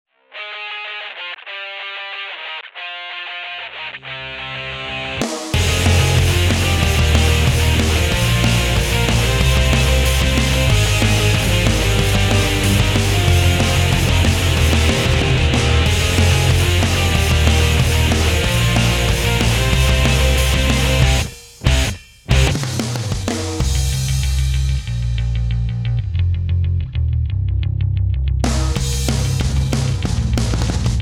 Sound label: Thrash, Instrumental; Pony Music Archive